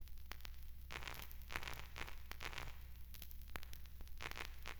static; LiveAct Pool; AcidJazz Funk

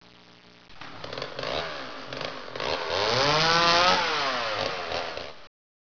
chainsaw

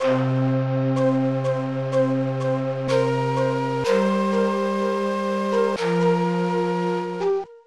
lose; F1 Licenseware - Volume 1; Win & Lose

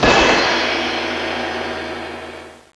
Bang; Princess and the Pea; SoftKey

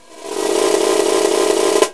HI DRUM

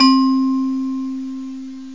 musicbox; gmplay

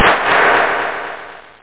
ECHO CLAP; Loop Scream Tracker Sample

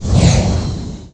MISSILES

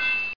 anvil; Wired for Sound Pro CD; Aristosoft, Inc.; funnysnd

